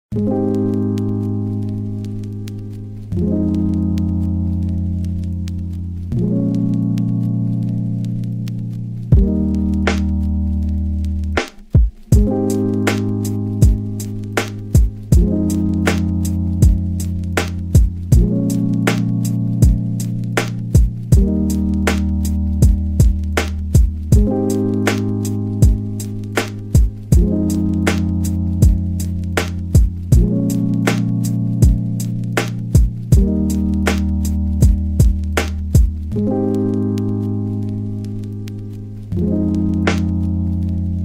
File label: BEAT Lofi HipHop INSTRUMENTAL